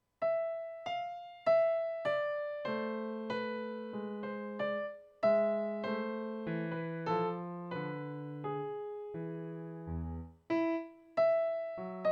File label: Sad Mood; Turk